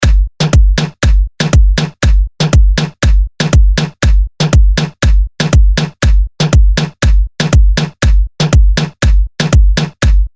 Hype ReDrum 120 Bpm

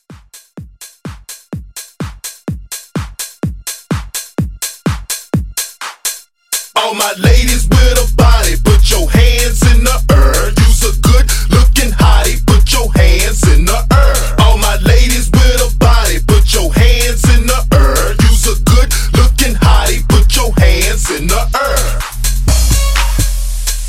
Club House

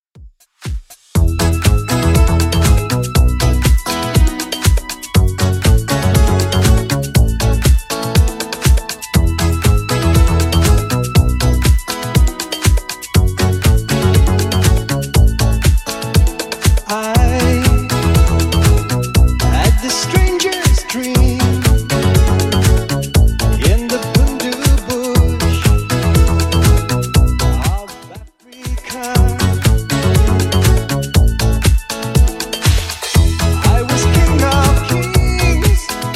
80s 120bpm; Redrum

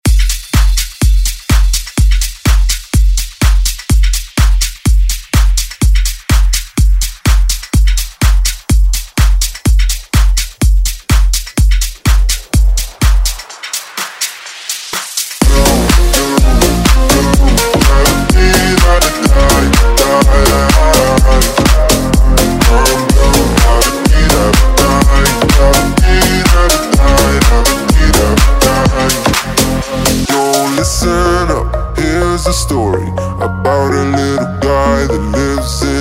Dance Redrum

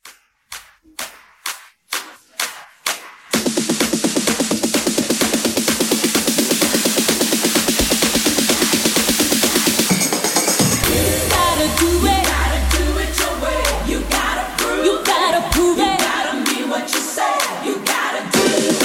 Mashup Quick Clap Starter 128

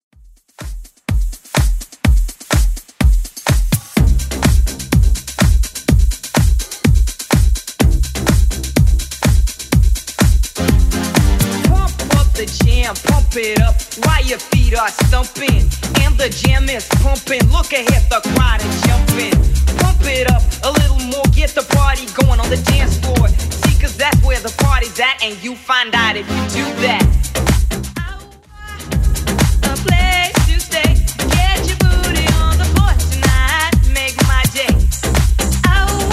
80s Club Edit 125bpm

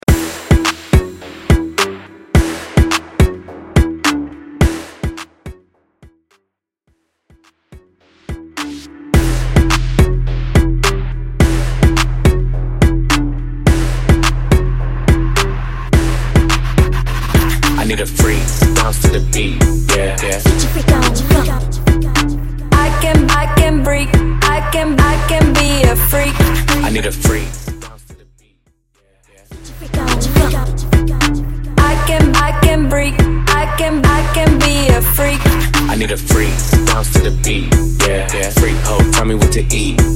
Hip Hop Rap

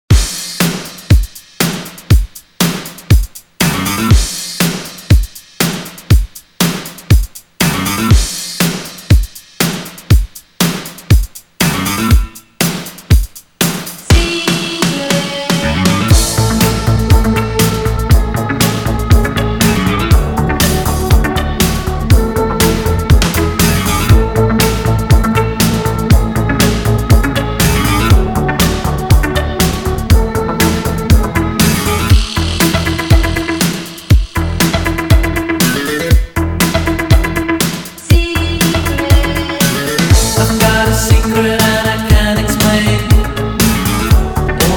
Redrum 120Bpm

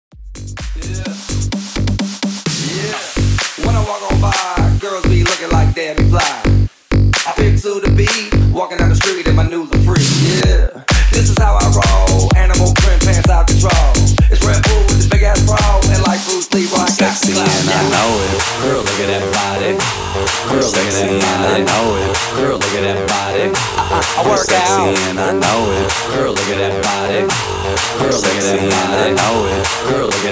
128 BPM

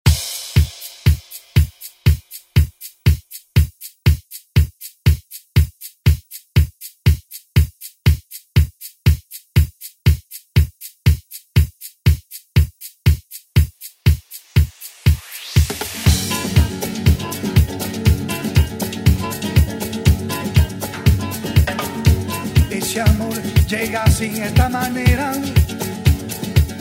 ReDrum; DJ Mhark

